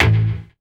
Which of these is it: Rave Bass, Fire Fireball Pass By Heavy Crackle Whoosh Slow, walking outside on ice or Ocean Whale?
Rave Bass